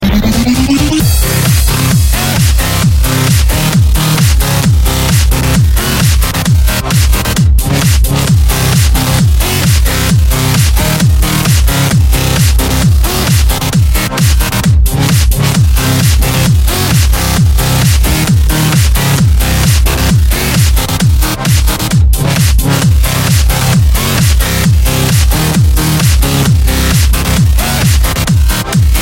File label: Hard Electro Sms